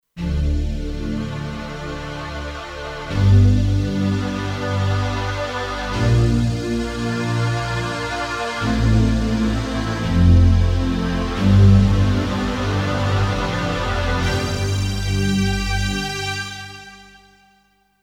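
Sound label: Slow Flange Strings, Kurzweil K2500X; Factory Programs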